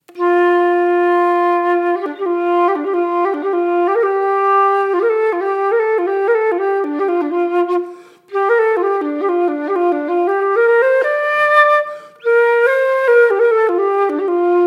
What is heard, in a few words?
Yamaha YFL212 Flute; FluteShop